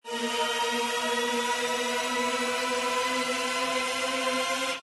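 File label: respin Sound; jackhammer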